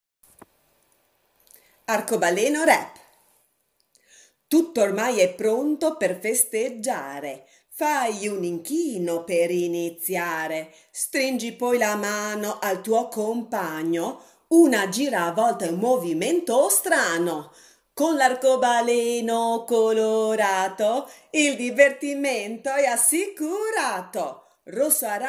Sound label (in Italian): Arcobaleno rap